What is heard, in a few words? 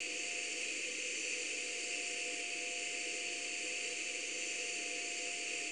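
turbulence, jet, atmosphere; Total Control - Action Cutz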